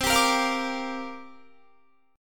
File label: C 9th Suspended 4th C down Guitar 4F, 4A, 5C, 5G, 5C, 6E; C9sus4 strummed